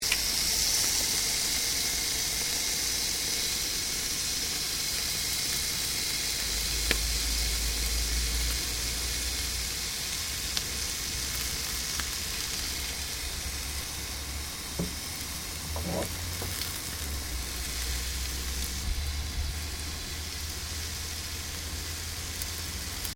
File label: COOKING BBQ SAUSAGES SIZZLING; SoundEffects Foundation2